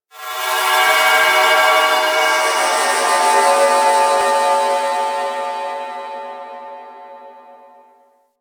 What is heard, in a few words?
Moon Texture Medium, Ambience; Warframe; Orokin